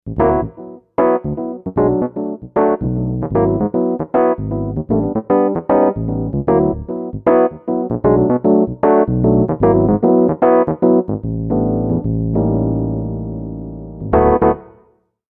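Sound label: vintage electric piano; Juno-Gi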